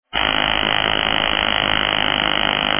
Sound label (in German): vulcain 120 kurz, armbandwecker